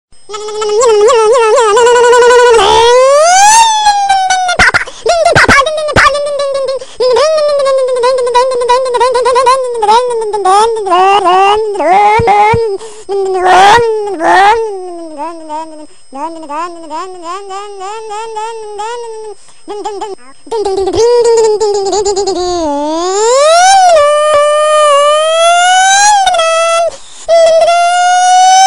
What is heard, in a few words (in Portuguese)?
voz engracada imitando moto acelerando